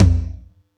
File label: Yamaha EX-5